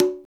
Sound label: PRC PDC CO, Latin; Roland - Rhythm Section; Latin 4